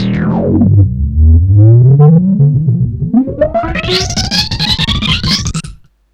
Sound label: fx drums; EZRollers